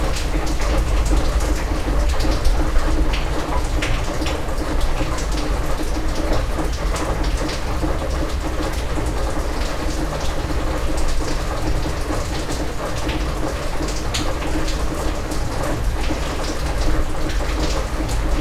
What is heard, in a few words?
Outside Shop Rain